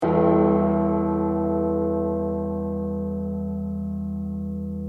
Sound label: bell, loops